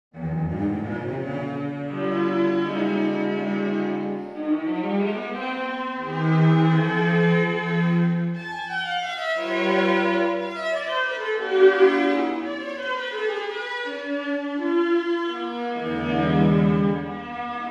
Trio weteq

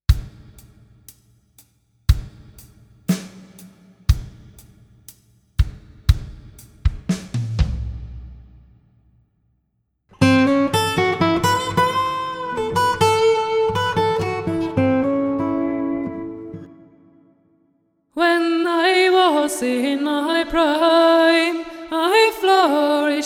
Ambience

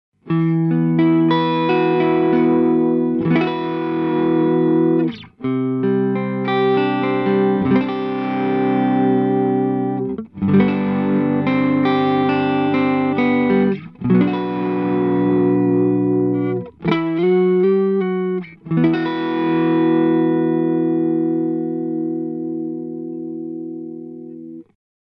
Korg NuTekt TR-S Darker Setting full wet P-90

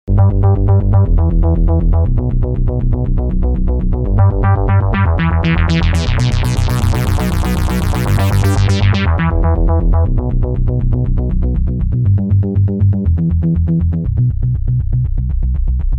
Sequencerbass Velo

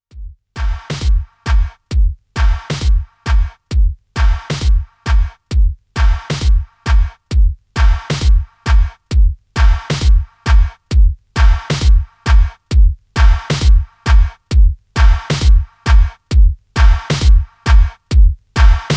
Drive

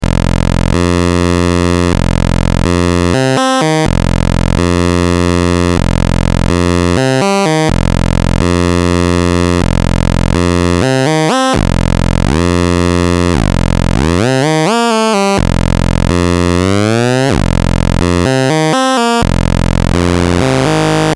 Osc 1 Pulse 25 Per PWM Pure; Roland SE-02

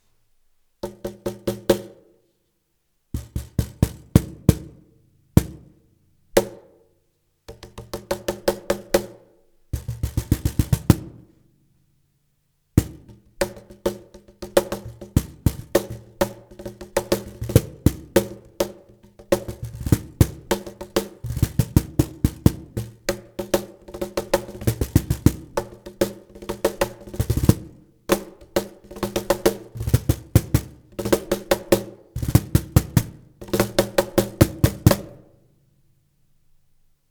Meinl Cajon Test Headliner

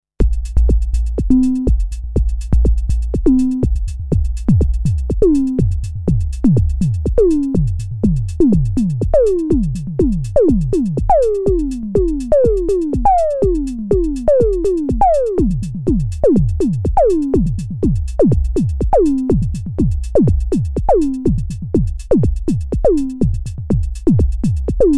Akai Tom Cat Disco Tom

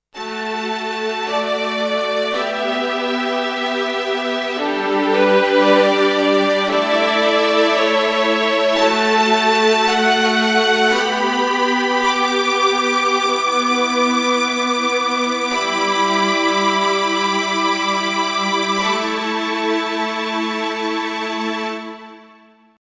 Ketron SD 90 Strings

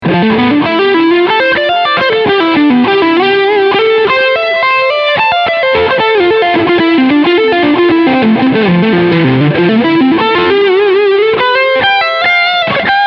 Max Gain Delay

